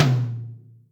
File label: Room, Acoustic Tom